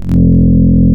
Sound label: Instrument Oboe; Ableton Live 12 Lite; Vector; Sprites